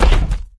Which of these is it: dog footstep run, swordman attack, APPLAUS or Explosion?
dog footstep run